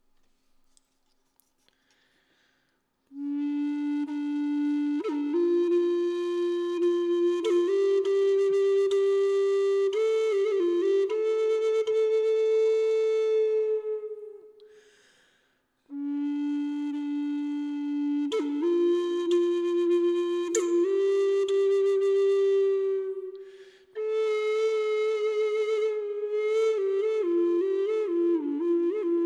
flute tete aigle re 432

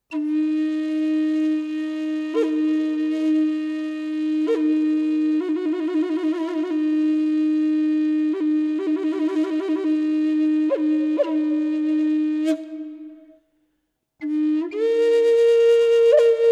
flute simple mib

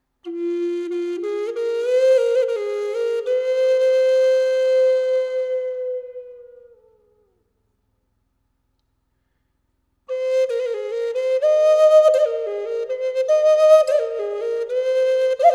flute fleur sylve Fa 440

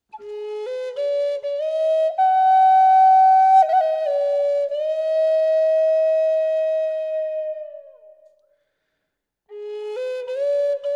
flute simple colibri La

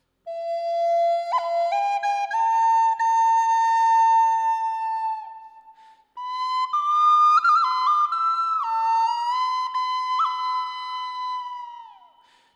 pipeau fa

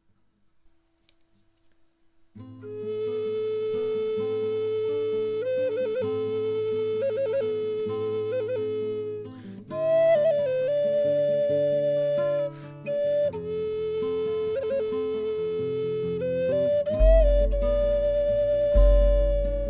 La med 432hz

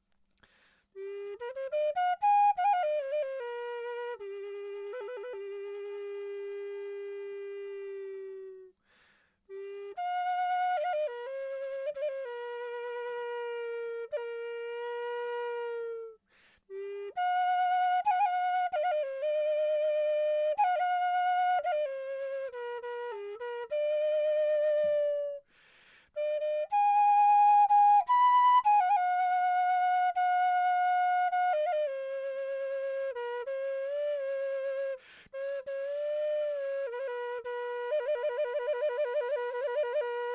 Sol aigu 432hz

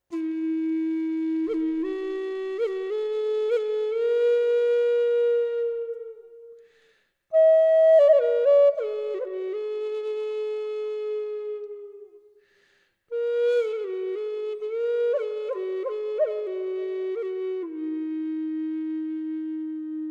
flute 432 baleine

